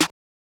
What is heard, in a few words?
Open Snares